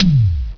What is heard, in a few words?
Synth Drum G2; Star Trek JR Audio